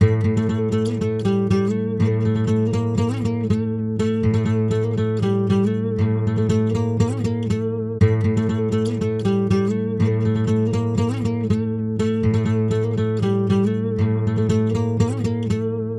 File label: Eastern Guitar Steel String 120bpm A